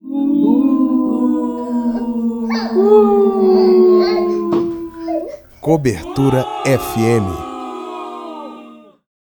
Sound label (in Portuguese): vinheta